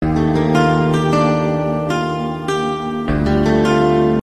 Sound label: tadadada; unloze